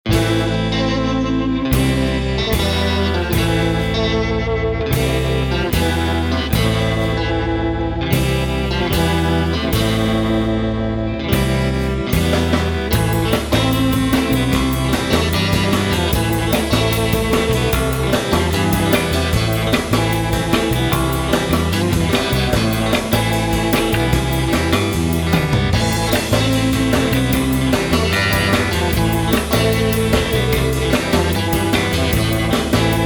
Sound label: rock fiction